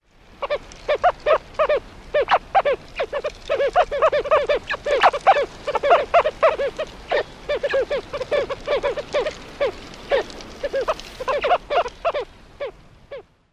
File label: social calls